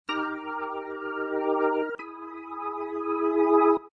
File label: lounge organ; Lounge & Reggae; Loopology; Be Bop Baby